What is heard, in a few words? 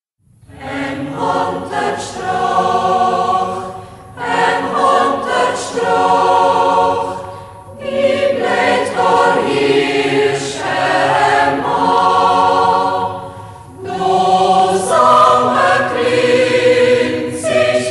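kammerchor, Schässburg; Ortsmundart: Schäßburg; Äm Honterstreoch; Schäßburger Kammerchor; Hegt wird gesangen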